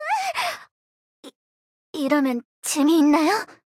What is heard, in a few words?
fight